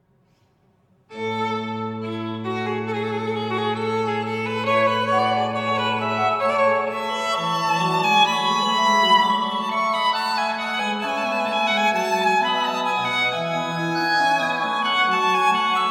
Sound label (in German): violine orgel satz